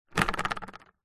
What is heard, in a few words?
vehicles bicycle wheel down hard mud gaurd flap vibrate; zapsplat